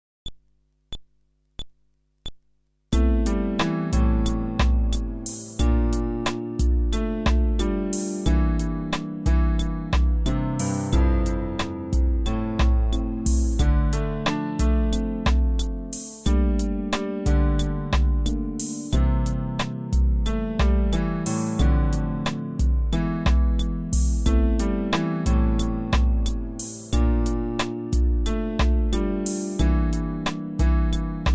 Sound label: Groove, fretless bass